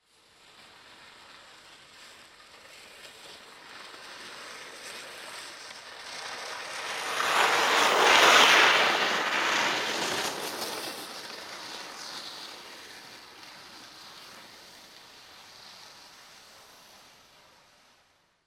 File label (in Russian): lizhi proezd mimo shurshanie snega